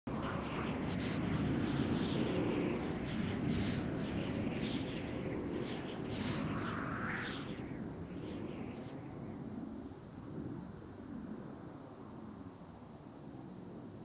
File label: wind; gmod; paris